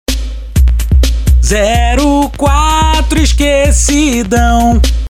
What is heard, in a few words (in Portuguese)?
funk